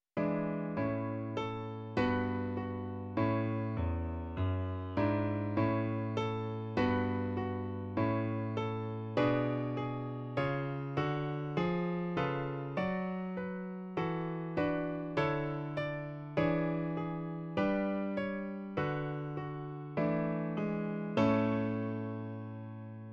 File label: Fourths, no voice